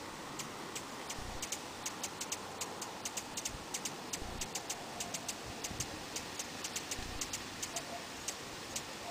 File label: hummingbird